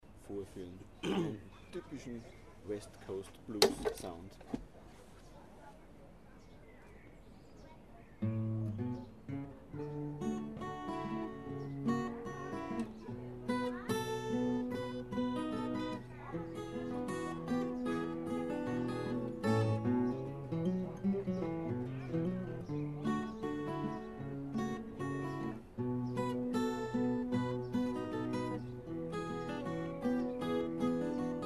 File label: west coast blues